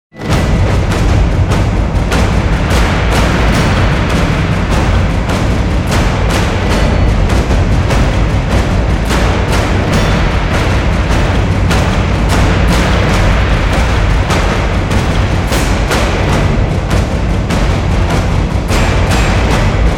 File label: Raging Horde, Drums